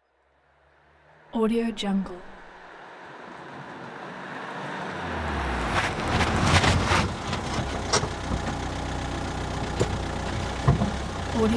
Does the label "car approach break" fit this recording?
Yes